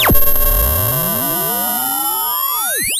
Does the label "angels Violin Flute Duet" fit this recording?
No